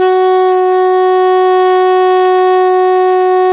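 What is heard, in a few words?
ALTO pianissimo F#3, Sax; Giga Samples Collection; ALTO SAX PP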